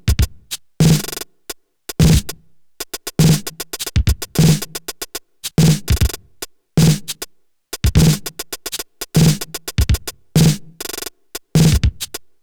IO Labs Flux Vierviertel Pattern manipulierte Steps

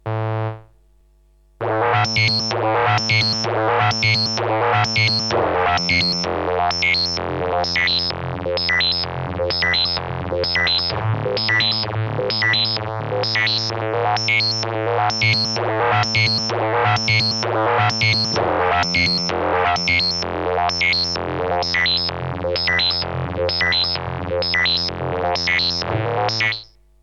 Red Panda Radius Synth Freq Shifter mit Step Sequencer

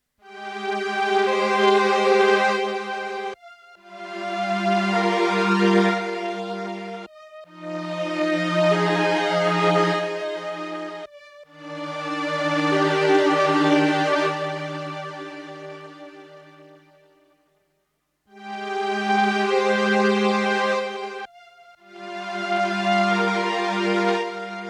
VP330 VC340 Strings Neutriggern schneidet Attack ab

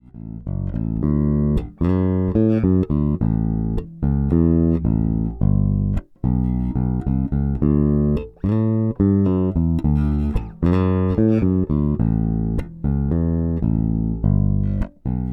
MB 4 SBK Deluxe Series hintere Spule; Harley Benton

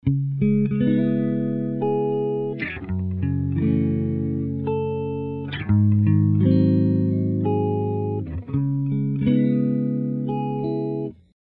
Gitarre Hi Countour 100 Comb 5.0; Melodyne 5

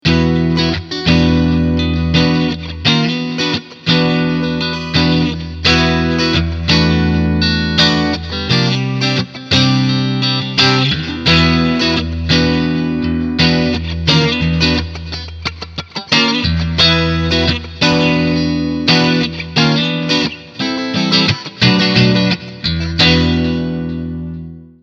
Clean Front und Middle

